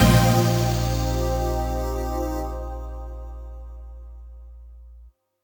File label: Game Over End; Kity